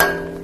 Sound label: fold; tuxpaint; magic